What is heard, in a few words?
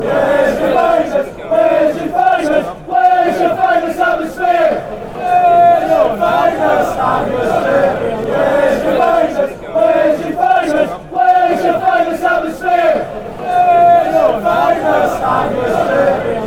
wheres your famous atmosphere